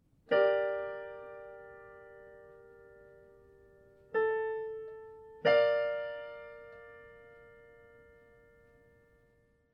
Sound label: Mediant mod g minor to Bb Maj